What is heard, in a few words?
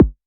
Multi-Kick Short